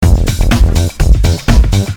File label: depressing rock music